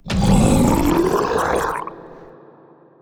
scream gurgle medium

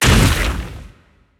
beast lava ball splash

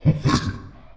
mother knife start; finalmother

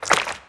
jump; gish

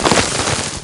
rock crumble

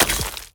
kick

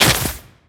sharp plug

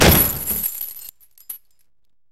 chain break

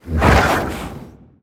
horse attack; death

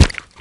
meat feet slow

